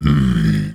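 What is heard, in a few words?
dragon ambient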